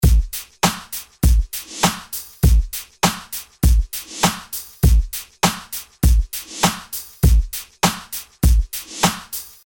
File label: Drum Loop Tube Dist